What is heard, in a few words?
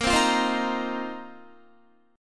Bb Suspended 2nd Suspended 4th, Guitar; Bbsus2sus4 strummed